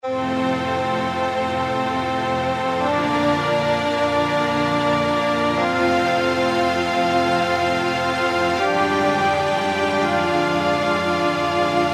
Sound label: Strings & Winds; Kurzweil K2000 V3